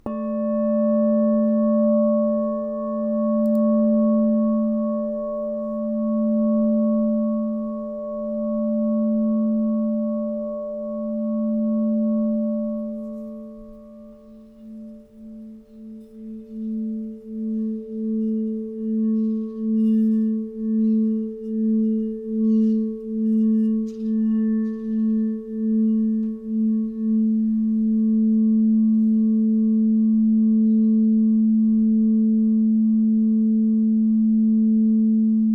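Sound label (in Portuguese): TACA A3, instrumentos de quartzo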